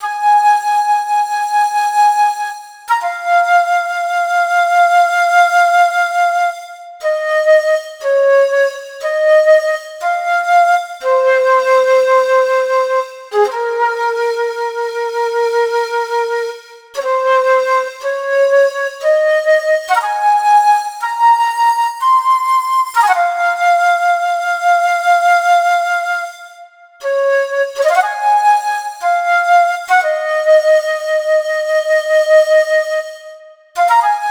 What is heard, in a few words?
Unused Flute Song; Elden Ring; PS4; Xbox Series X/S